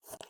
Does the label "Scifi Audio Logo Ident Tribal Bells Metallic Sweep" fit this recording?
No